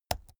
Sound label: keyboard laptop key press slow hard